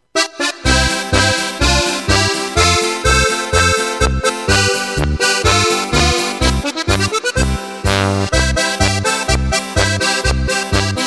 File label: Goassbock Playback; midifile